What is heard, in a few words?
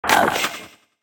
skelly pain